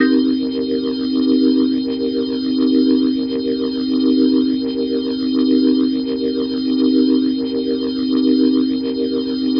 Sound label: Glowing Organ C Min7, Rock Organ Licks